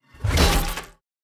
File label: fallen angel strike